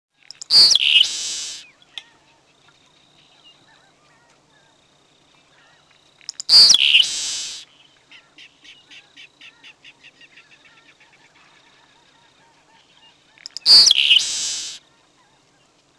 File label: seaside sparrow